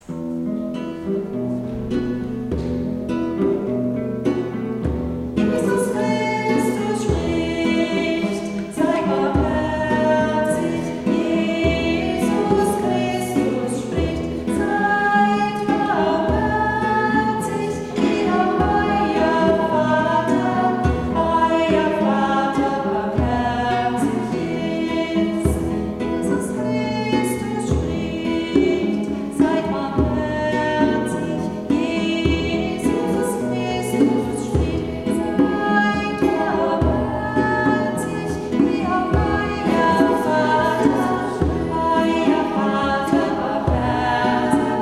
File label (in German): mehrstimmig; Jahreslosung 2021